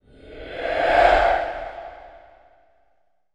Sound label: reverse surreal breaths; Sound Design - Horror; Sony Pictures Sound Effects Series Volumes 1-10; Sony Creative Software